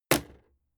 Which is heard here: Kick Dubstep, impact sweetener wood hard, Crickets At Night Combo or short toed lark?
impact sweetener wood hard